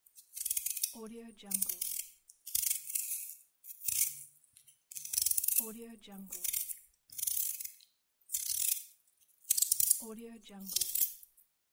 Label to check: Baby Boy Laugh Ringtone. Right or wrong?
Wrong